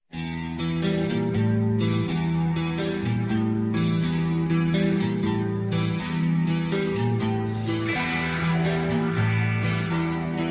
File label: rock